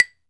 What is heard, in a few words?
claves; Cuba